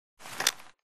pull out; Titanfall 2

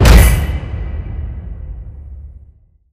pod door close start; Titanfall 2

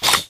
control room panel activate; Titanfall 2

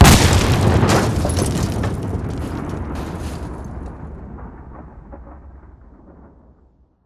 Explo Close; AI Reaper; Titanfall 2

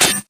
sentry gun deployment denied beep; coop; Titanfall 2

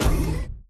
footstep sprint close; Titanfall 2

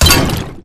imp bullet lightballistic bubbleshield; Titanfall 2

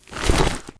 Grunt Mvmt FOL Soft Med; Titanfall 2

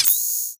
spectre initialize beep; Titanfall 2

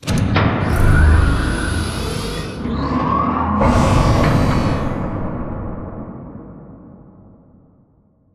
Giant Floor Panel Assemble Rotate Mech; Boomtown; Sideways Town; Titanfall 2

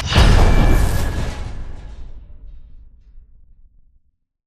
spectre overload; Titanfall 2

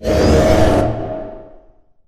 pod door open slide; Titanfall 2

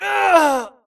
grunt death quick; Titanfall 2

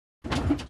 atlas rattle int; Titanfall 2

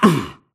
grunt hits; Titanfall 2

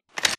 reload; wpn mgl; Titanfall 2

